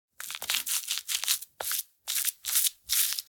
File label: Lotion tube skincare rub on face